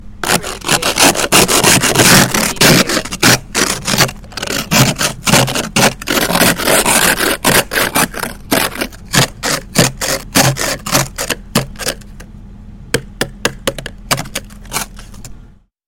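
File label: Scraping Ice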